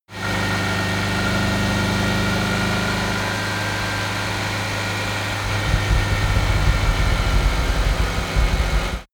car dacia slow driving rattle slow down

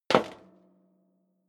step leather shoes metal rattle single stair up

